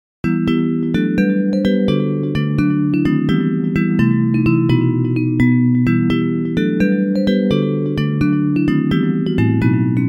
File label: Bells